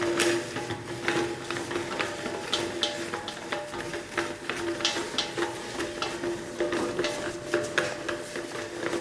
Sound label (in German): flipchart rollen